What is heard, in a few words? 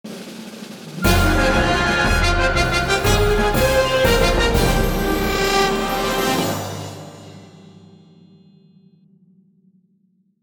Airship Intro Music Stem; fat princess adventures; ps4